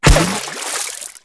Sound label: cg water; weapons; Tribes2